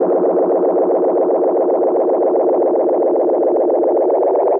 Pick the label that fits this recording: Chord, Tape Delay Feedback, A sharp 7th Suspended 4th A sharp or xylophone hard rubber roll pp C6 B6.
Tape Delay Feedback